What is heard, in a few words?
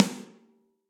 Snare Hit & Rim Ambient Loud Punch; Drum Hits; Ableton; Factory Packs; Session Drums Studio; MPE Hits